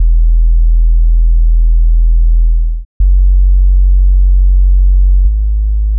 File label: SUB; Crowdsourced Sample Pack; Drum Kit; 808s & Subs; SUB RIF FO DAT ASS